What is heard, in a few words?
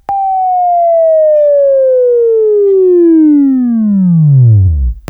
WAV Left, Sweeping